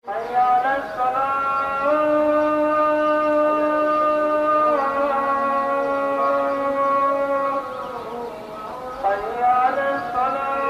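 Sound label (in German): Gilgit Muezzin; Pakistan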